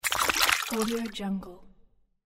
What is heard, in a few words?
fishing fish activity